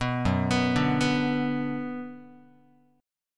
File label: F sharp Suspended 4th Sharp 5th Guitar, chord; arpeggiated; F#sus4#5